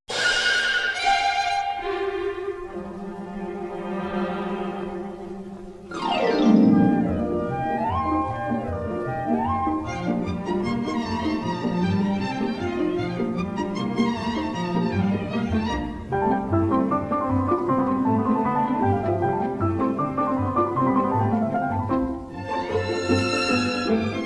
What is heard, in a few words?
Orchestre